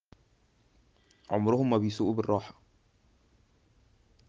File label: traffic